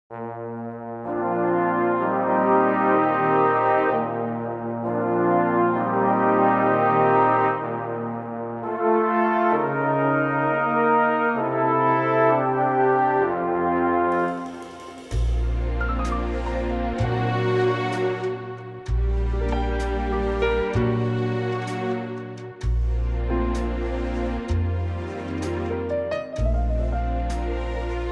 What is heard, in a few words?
Bb orchestral